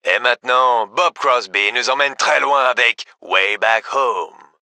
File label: music